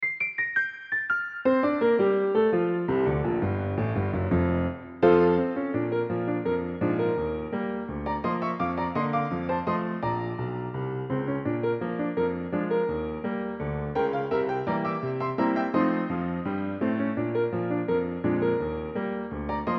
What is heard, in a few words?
Piano; Piano Sound Effects